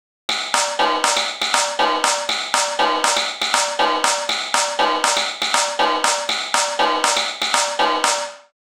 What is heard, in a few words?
Technorganic kit 3